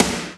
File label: sparkle snare drum b key; snare drum samples